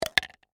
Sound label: Golf Ball Hole, Sports, Golf, Ball, Into, Hole; Put, Put